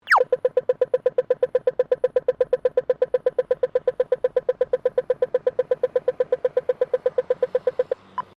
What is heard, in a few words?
Traffic Lights Australia Pedestrian Walk Signal; Traffic lights pedestrian crossing; Traffic and Cars